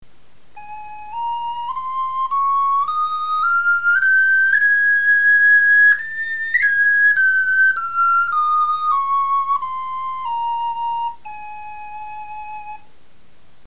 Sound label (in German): tonleiter, knochenfloeten